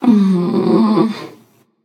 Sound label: sigh passion; chaac